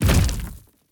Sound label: atlas footsteps; smite; god atlas